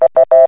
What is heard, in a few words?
times up